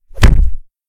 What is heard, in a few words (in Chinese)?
一脚踹到少年脸上; 环境音; 非循环音(SE); Eustia